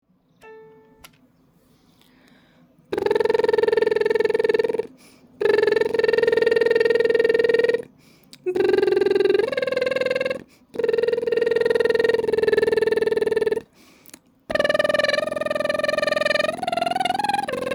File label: lascia ch io pianga breath; Two Weeks to Better Breath Management